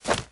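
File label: barbarian footstep no voice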